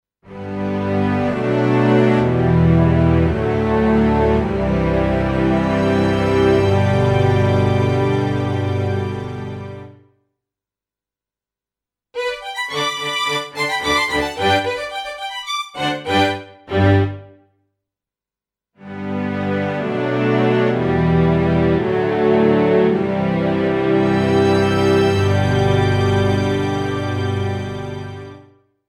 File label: Strings